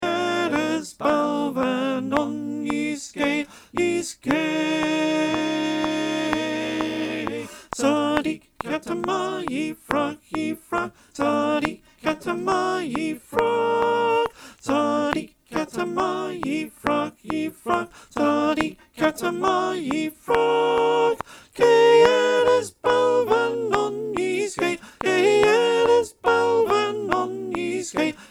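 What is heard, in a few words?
Soprano clicktrack, choir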